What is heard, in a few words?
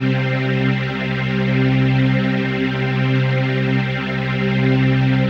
PHASE PAD